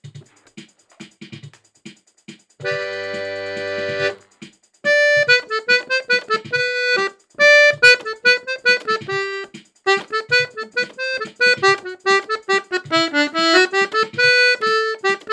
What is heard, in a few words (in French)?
Mazurka en Sol